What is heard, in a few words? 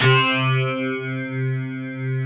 FM ELP 7 C2 L, Keyboards Old School; USB Soundscan vol.09; AKAI